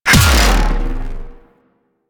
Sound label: Kinetic Plating Hit; Warframe; Runner